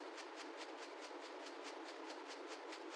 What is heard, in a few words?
fan